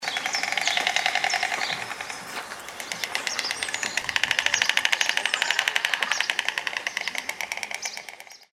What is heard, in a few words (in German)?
Klapperstorch